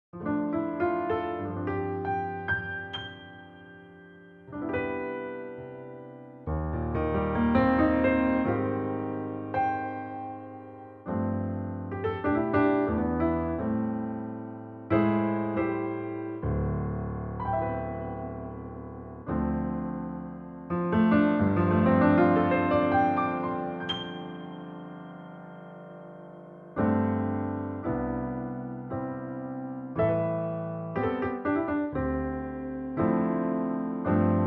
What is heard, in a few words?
C to Db piano